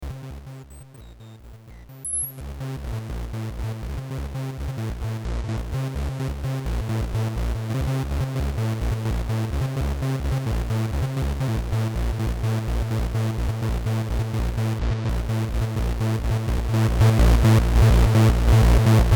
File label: BASS TRANCE